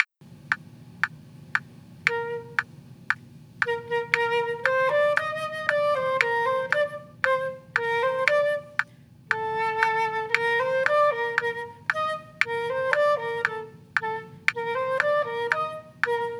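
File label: trio with percussion, shepherds hey; toe excellence in chamber music bk 1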